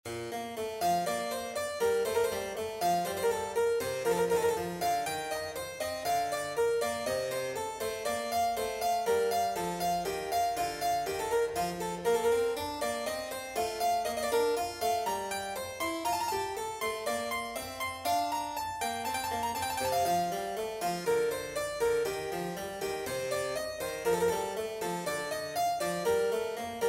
keyboard sonatina in b flat major, piano; hwv 585

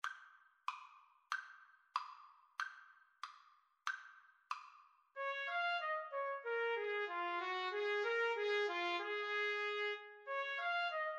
2 trumpet

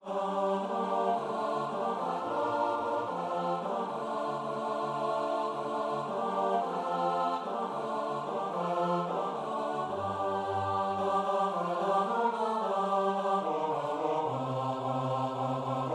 gaudete CHOIR

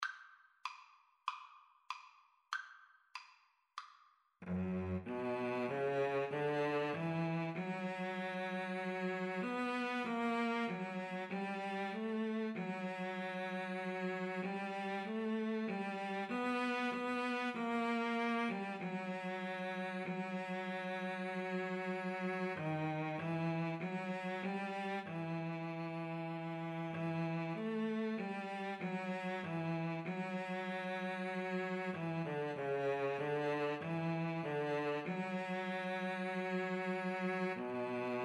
violin cello, Violin-Cello Duet; el cant del ocells

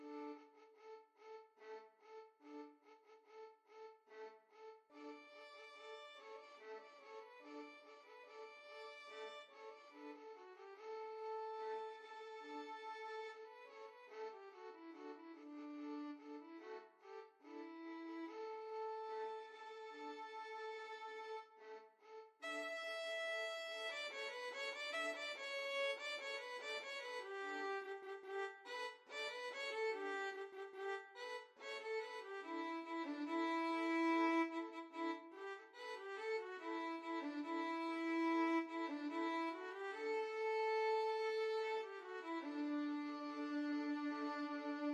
bolero violin; ravel; violin trio

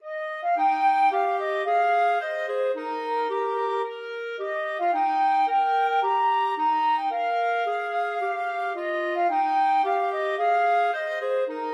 FL CL duet, flute-clarinet duet

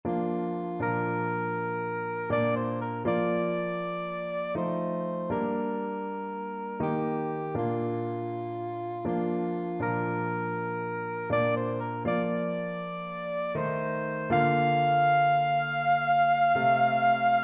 amazing grace soprano saxophone

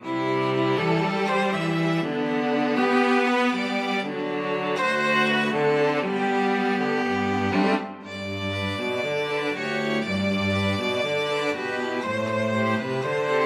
STRQ, string quartet

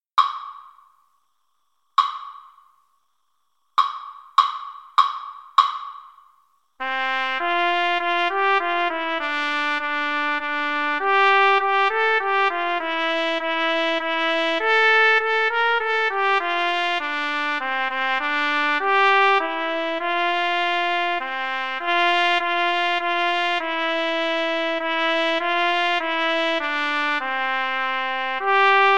trumpet duet

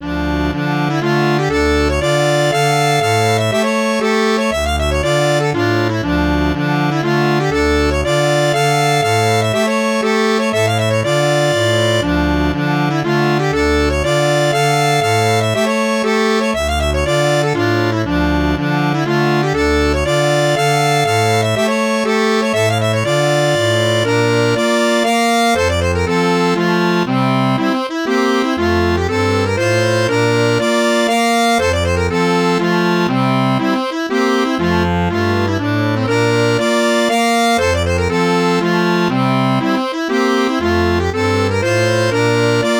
Starkens Polska, accordion

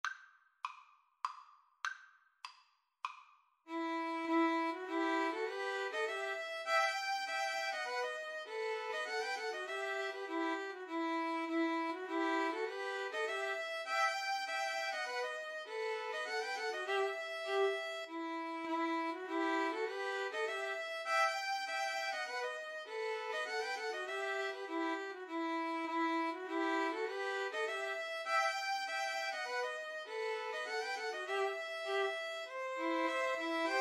Polska, 2 Violins Cello; Starkens

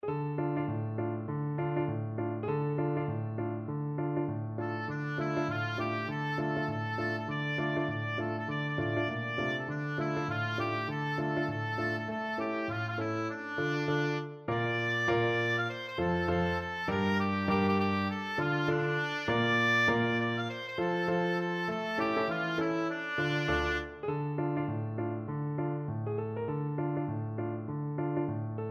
wellerman, oboe

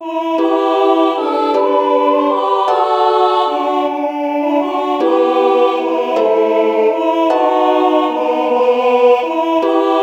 Uke, ukulele

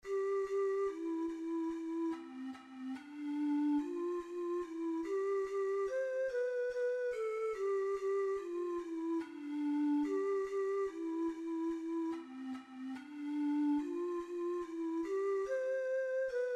manly heart, ocarina four hole

